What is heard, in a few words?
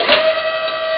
Unused Door Sound